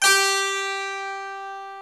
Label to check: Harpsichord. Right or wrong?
Right